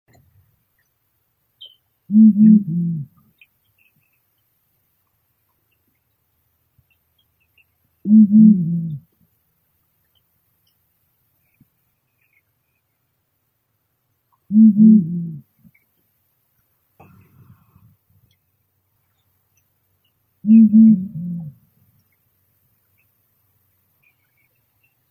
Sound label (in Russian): filin ribnii bubo blakistoni